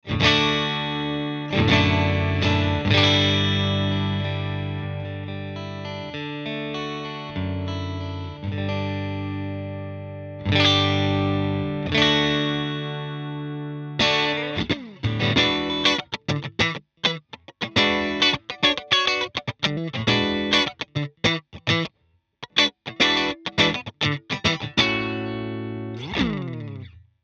amp studio crunch; guitar

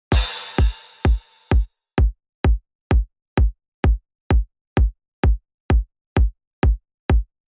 Drum

